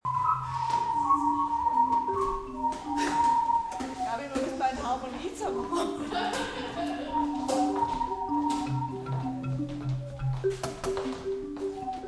music workshop jetzt an die instrumente; storymaker

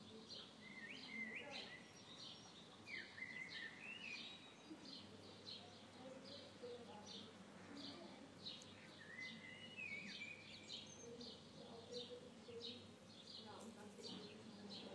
balkon gezwitscher

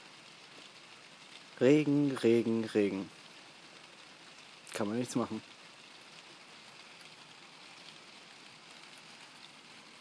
Regen Regen Regen

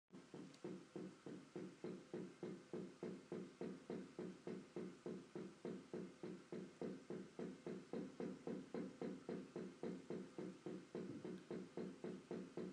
rhythmisches pochen aus der nachbarwohnung

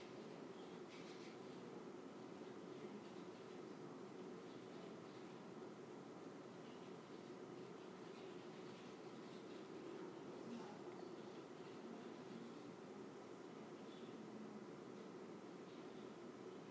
Grundrauschen

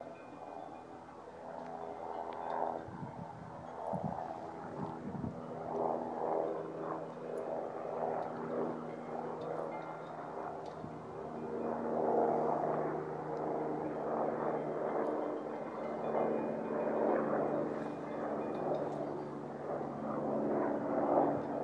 hubschrauber kuhe

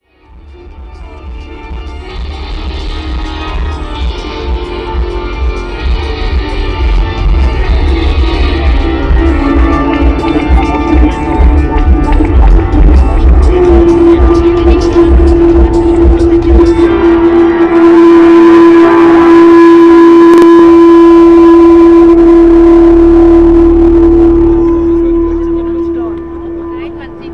Orgelimprovisation

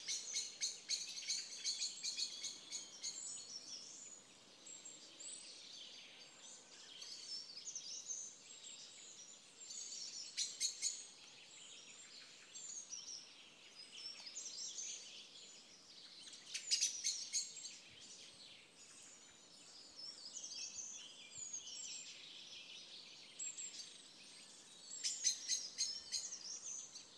Vogelsound am Flack

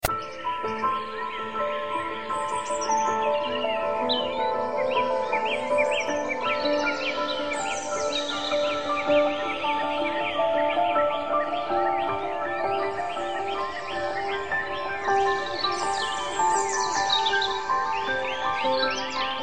gemafreie musik natur langsam

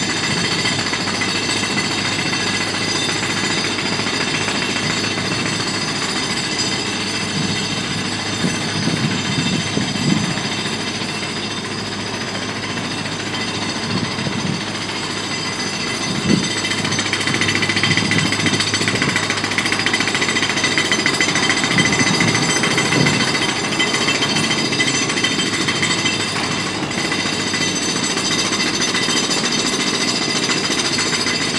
presslufthammer und zuckerrübenlastwagen

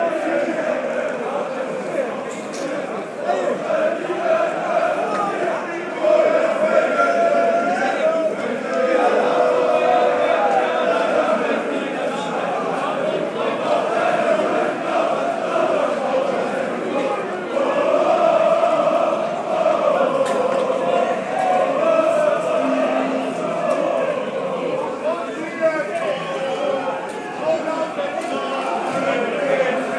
Stadionatmosphäre auf Gleis

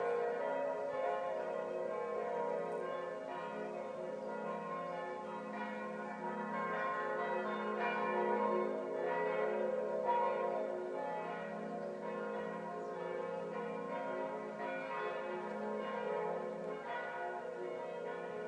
die glocken stammheims

